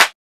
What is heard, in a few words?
snare drum u clap; neuro; Sinistarr; Drums